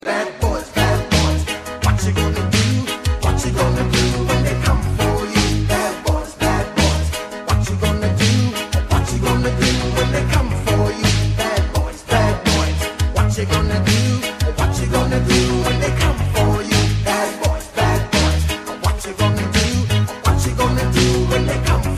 Rap ringtone

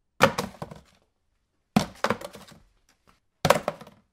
plastic container

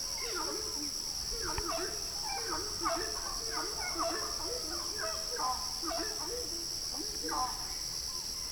Horned screamer, پرنده

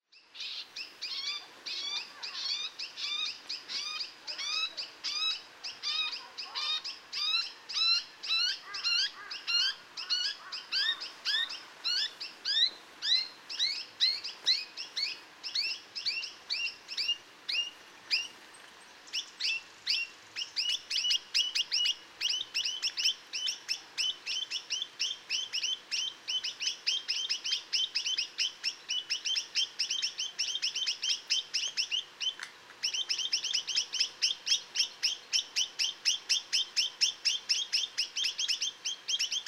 Chinese nuthatch; پرنده کمرکولی چینی

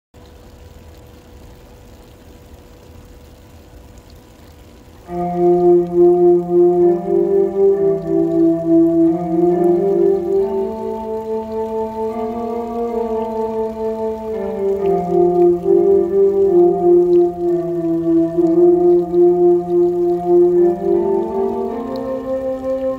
Hydraulophone

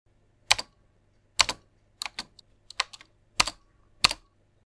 enter button

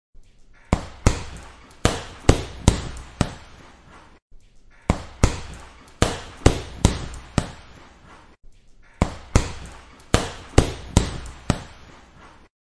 Punching punching bag; مشت زدن به کیسه بوکس

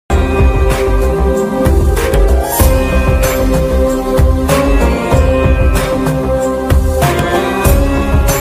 Spiritual ringtone

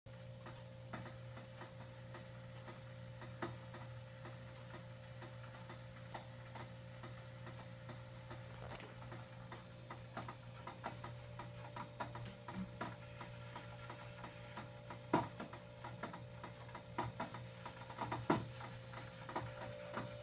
Water cooler

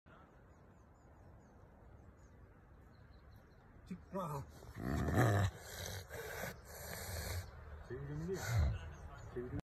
Clouded leopard; پلنگ ابری

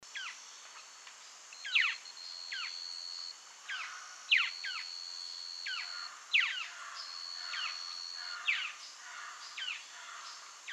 Cherrie's antwren

